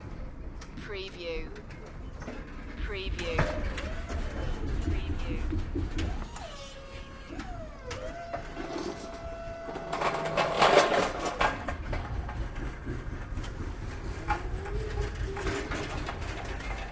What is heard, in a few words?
Loading Dock Electric Forklift Pneumatics